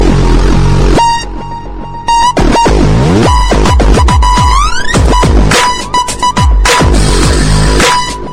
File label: Heavy Loops Sms